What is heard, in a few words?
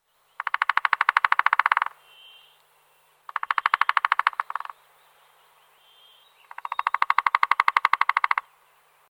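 three-toed woodpecker; Dyatel trehpalyy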